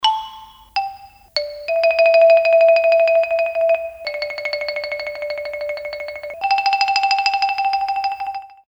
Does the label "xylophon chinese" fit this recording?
Yes